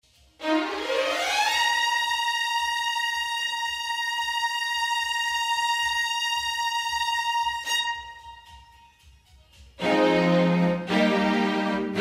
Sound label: strg blend